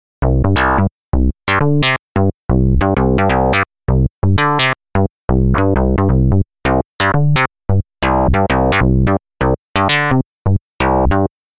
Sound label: Bass